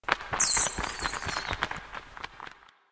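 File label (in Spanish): Ambiente galerias murcielago, tunnels bat ambience; yesterday origins gamerip 2016 switch ps4 pc ios macos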